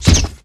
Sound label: rocket; joker; Gotham City Speed